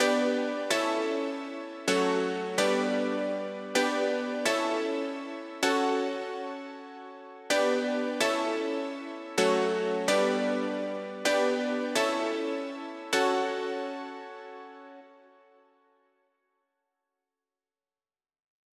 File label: 128BPM Piano Root G, TechHouse; Full Effect; Vengeance Samples; Bonus TechHouse Kits